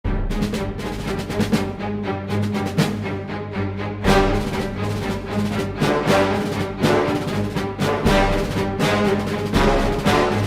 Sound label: Creepy Castle Tension